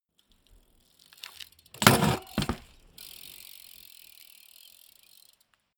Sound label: bmx maneuver arrive grind drive off